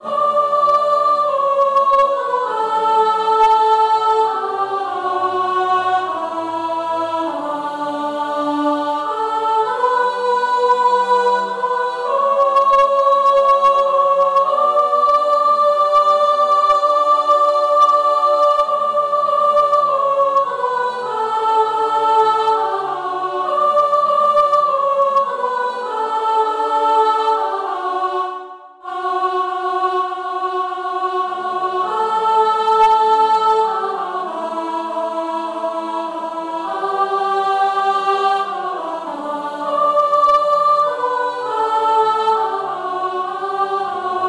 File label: Joy sop